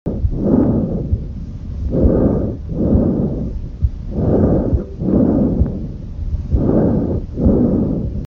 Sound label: Tracheal Sound